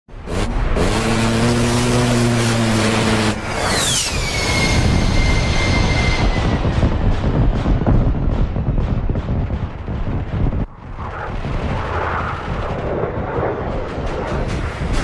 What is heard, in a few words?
A 10 Narrowly Escaps Anti Aircraft